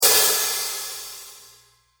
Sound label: cymbal